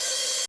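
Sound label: kawai k1 hi hat; wavetables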